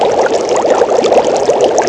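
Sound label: cauldron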